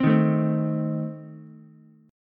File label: A Diminished A 5,3,5,3 down Ukulele; Adim strummed; JGuitar